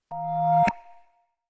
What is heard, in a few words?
Crescent, notifications; Samsung Galaxy S24